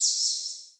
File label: ambience sanctuary birds; adams venture origins